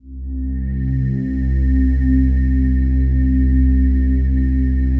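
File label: PAD, atmospheric pads; Best Service; XX Large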